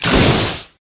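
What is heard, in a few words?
laser cutting machine noise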